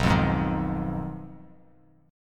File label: B 6th Flat 5th B down, chord